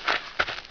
Pullup; Player; Heretic II Demo

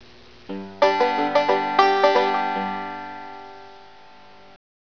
banjo

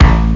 guitar

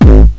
overdrive, bdrum samples

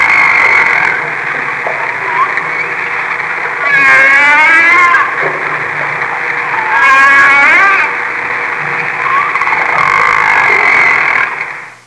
whale call; Sound Effects for Multimedia - Volume 1

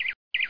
DAY CHIRP

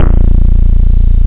bass; Aminet 21; GTI - Schatztruhe; Amiga 8-bit Sampled Voice; 8,363 sample rate

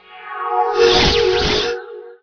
shrine; Heretic II Demo; items

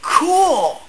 ouch; 3D Klondike v.1.0a

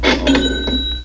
Money; Awesome BlackJack v1.6

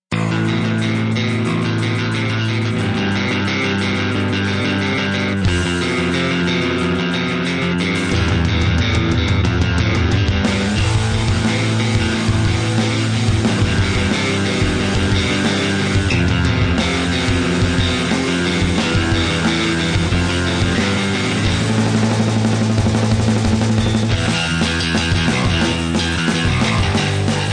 hard Bass; battle of the bands

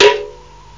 snare; OctaMED SoundStudio V1; jazzies samples

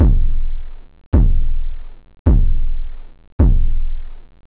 tone, drums techno; OctaMED SoundStudio V1

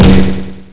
Zap; WARLOCK; Kroll

